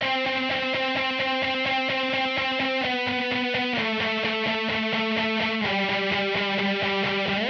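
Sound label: guitar riff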